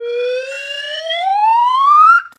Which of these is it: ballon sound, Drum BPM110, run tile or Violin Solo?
ballon sound